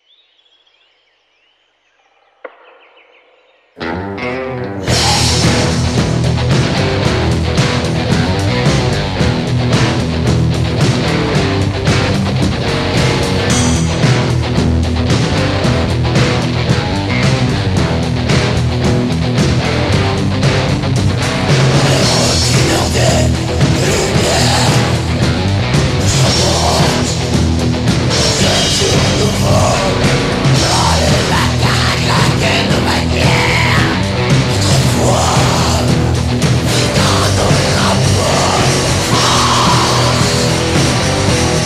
Black Metal de France

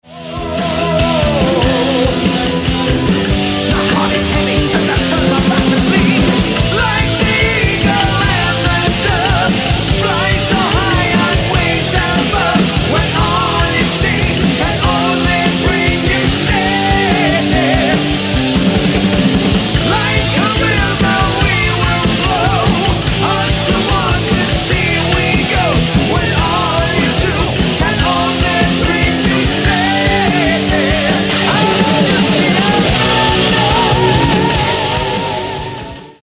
Chorus

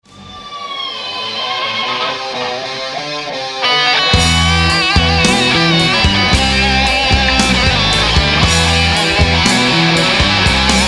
solo

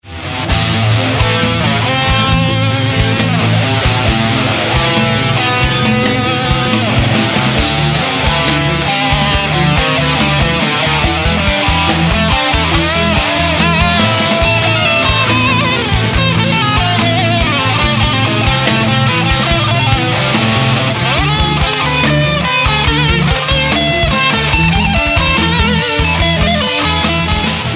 solo

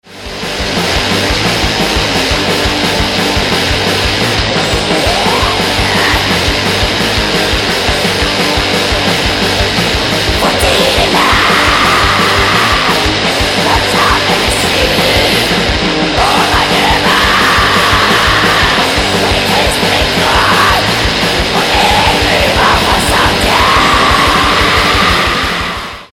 metal

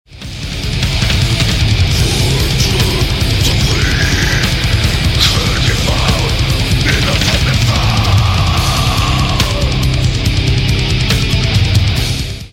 vocals

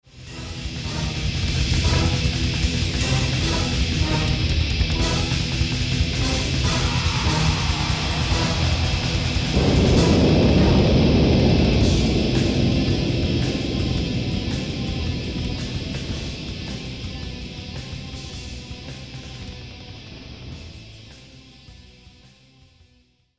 outro riff

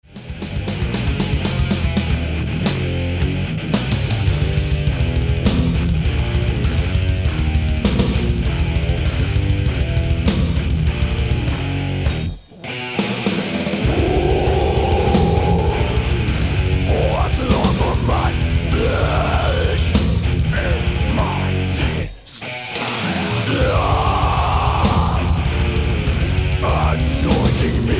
downtempo

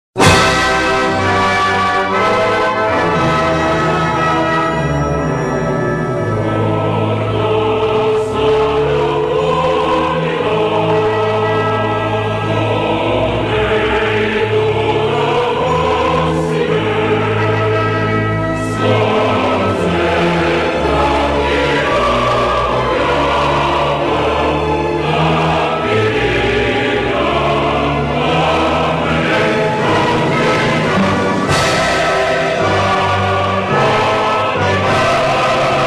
Bulgaria National Anthem

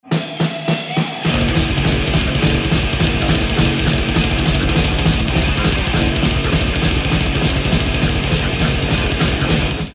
Double Bass

